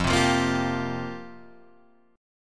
F Suspended 2nd Suspended 4th F down Guitar Drop D